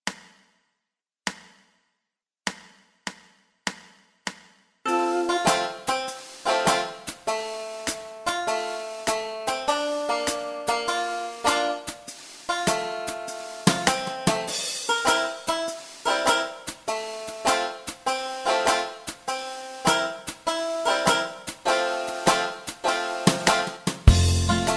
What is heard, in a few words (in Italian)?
base senza melodia